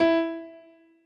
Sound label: b piano2 v100l8o5e, piano